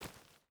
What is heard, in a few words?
footstep barefoot stones walk; Rust